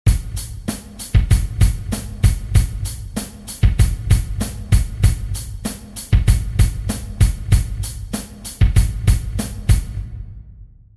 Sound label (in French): doubles croches grosse caisse